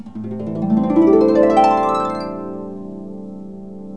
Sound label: HARP GLISS